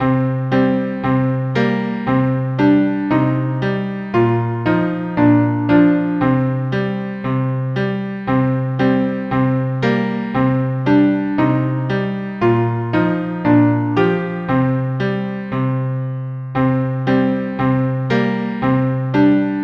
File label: piano arrangement